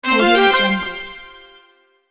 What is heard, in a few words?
strong fanfare